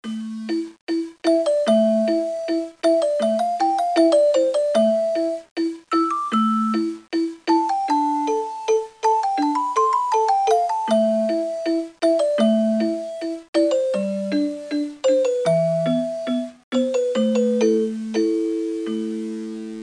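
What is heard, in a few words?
xylophone; My Science Fair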